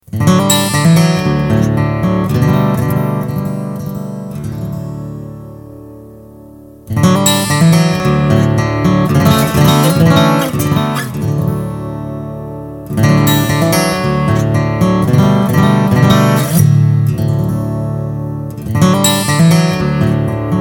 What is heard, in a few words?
mic and DI 4 dB up